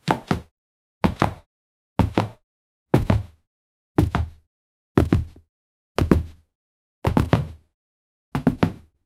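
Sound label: normal person jumping repeatedly, exercise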